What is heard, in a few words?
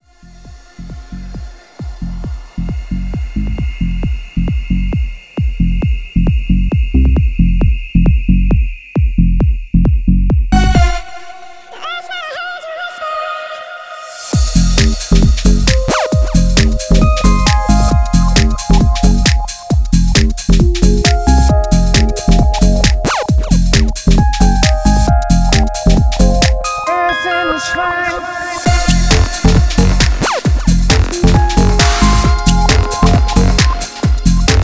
DUBSTEP